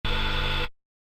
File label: robotic arm short; soundscrate